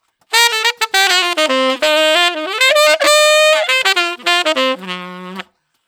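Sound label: 100 NuJazz Sax C major; Brass; Digidesign; Pro Tools; Pro Tools Essential Loops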